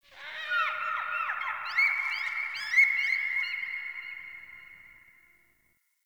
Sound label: Trapped Animal Chatter Distant; Ambience; Ode Sky Fortress; Fortress Tunnel